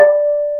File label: combo power; tetrio